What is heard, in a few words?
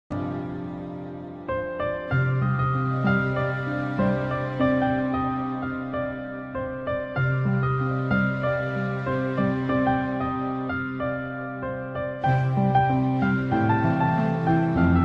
best instrumental music ringtone